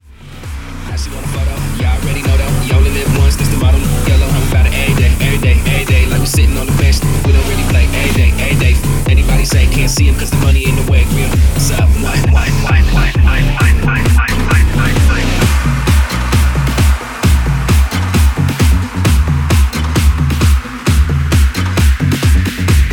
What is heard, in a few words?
funk; powermusic